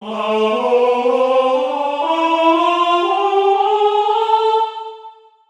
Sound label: bebop